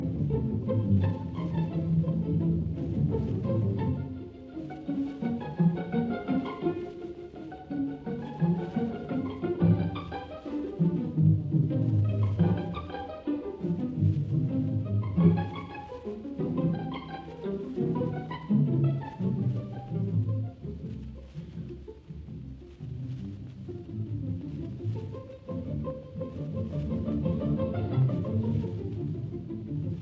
Symphony No 4 in F minor, Scherzo Pizzicato ostinato Allegro; Tchaikovsky; Op 36